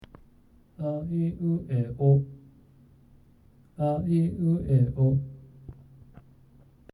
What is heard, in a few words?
aiueo bad